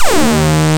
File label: ARP Synths, SAW SYNC B D1; E-MU Formula 4000 Series Vol. 3; Analog Odyssey